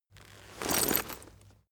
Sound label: leather metal handling long